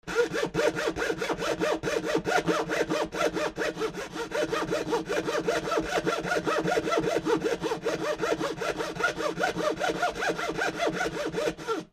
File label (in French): Scie; ecrins; Mars